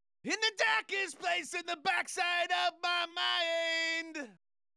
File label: Chorus BG, Vocal Tracks